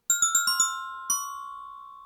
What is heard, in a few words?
Floating gull